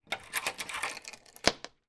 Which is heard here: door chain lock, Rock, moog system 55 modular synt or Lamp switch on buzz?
door chain lock